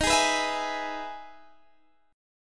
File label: E Major 7th D sharp, chord